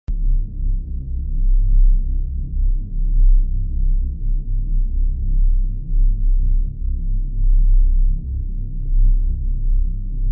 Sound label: cinematic background